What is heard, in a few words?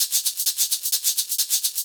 drum 130 hihat, Techno Trance, Drumloops; Techno Trance Essentials